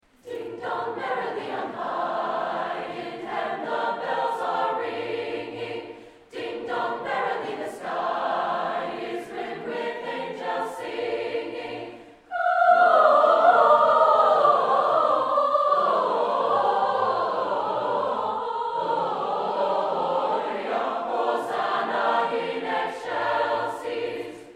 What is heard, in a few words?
Concert Choir